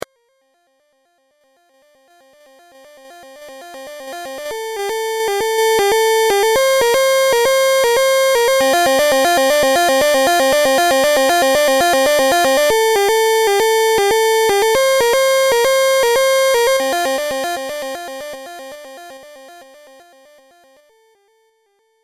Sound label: PULSE